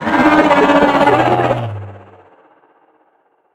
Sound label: creature pinnacarid callout